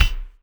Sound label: shut; cybermachine